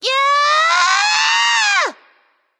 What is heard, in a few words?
battlecry; smite; voicepack; thanatos